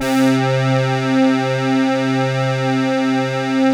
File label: Deep Bass c3, Korg EX-800